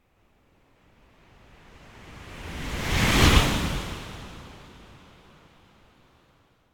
Wave By Strong, Fast And Deep Ocean Surf Wave - Passing L to R Wave Whoosh; Hollywood Edge; sound designer's toolkit v2